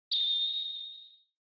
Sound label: Retro Anime Accent High Short